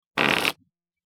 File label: comical fart noise